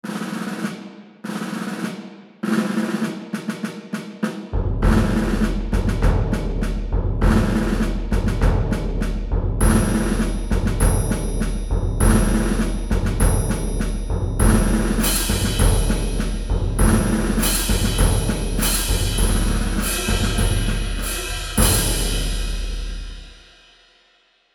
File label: Percussion